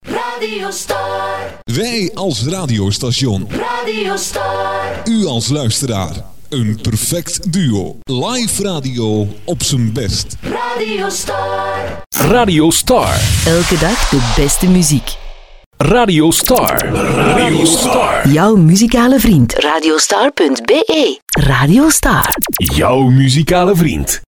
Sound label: jingles; Star Herzele